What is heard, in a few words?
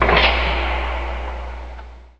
forklift